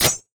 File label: click pop; hella farm